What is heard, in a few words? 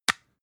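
floss close compartment hard, Bathroom; haus-garten; badezimmer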